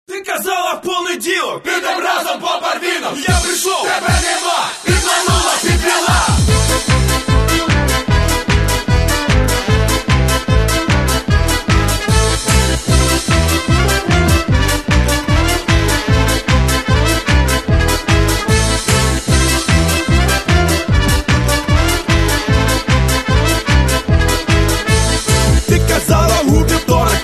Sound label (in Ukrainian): skrypka vechornyci